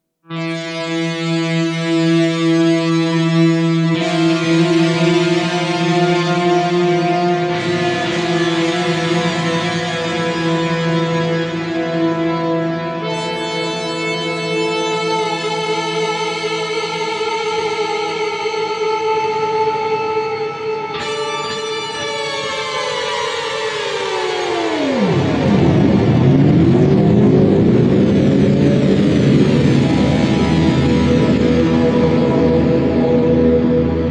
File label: axe gelic echo